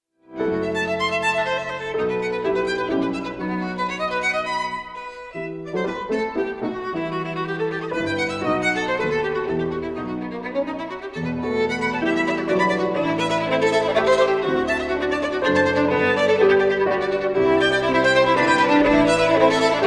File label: string quartet praeludium and allegro in the style of Gaetano Pugnani